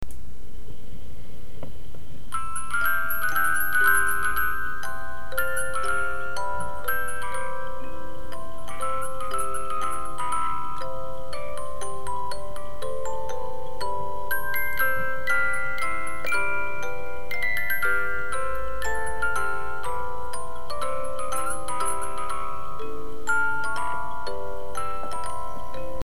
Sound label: minuet